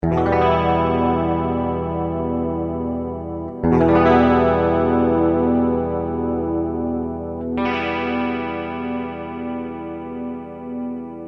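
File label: clean electric guitar dimension c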